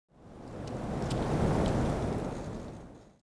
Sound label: wind TREE; The Elder Scrolls III - Morrowind; Morrowind GOTY GOG